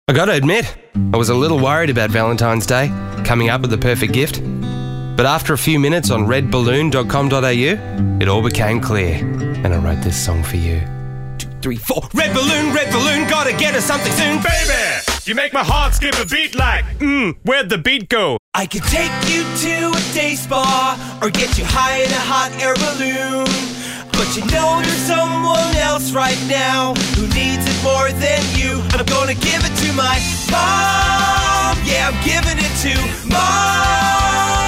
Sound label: singing; EM Voices